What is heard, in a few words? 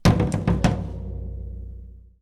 floor tom roll